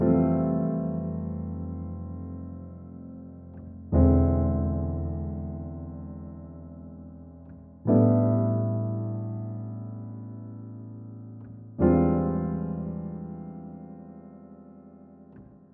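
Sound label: 122 BPM Piano Chord Progressions Cinematic Drama C major A minor Tonic E; MMB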